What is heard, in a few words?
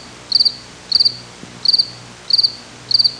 Cricket chirping softly in the night